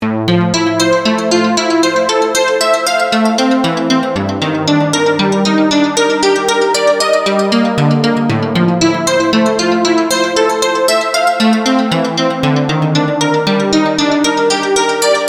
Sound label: Tangerine Arp